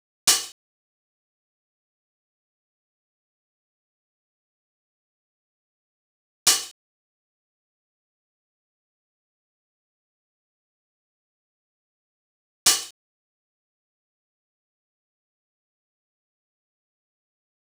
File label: open hi-hats 155bpm